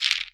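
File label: step sand left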